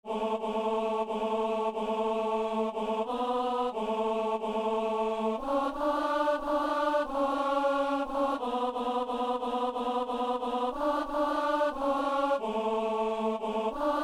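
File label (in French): ténor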